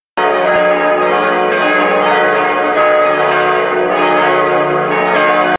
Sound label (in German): Glocke alle; beutelsbach